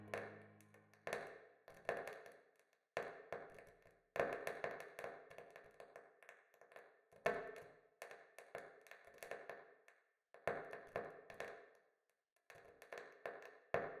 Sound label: Tapping; The 39 Steps